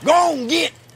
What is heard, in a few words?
harmonica; velveeta shells cheese